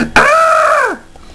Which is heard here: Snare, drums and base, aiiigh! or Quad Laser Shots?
aiiigh!